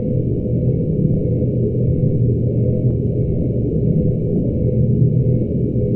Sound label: shield loop; weapons; dota; abaddon